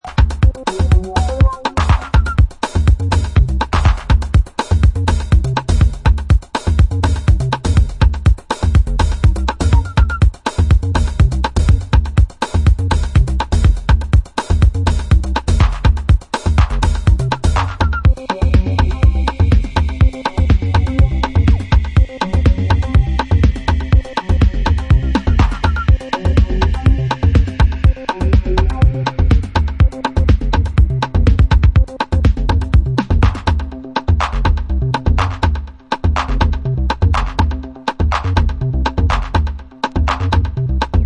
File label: drum n with the funk